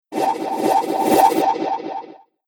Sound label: Triple Whoosh Sound; Whoosh Sound Effects; Andrew Fleming